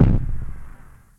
kick

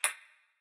Bullet Bounce

